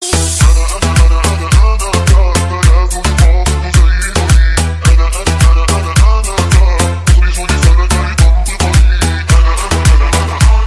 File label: arabic remix